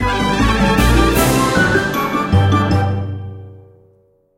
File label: Stinger Level Complete; Angry Birds Reloaded